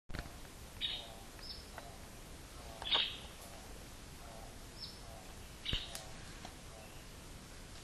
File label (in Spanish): Carpintero Oliva Manchado; Jorge La Grotteria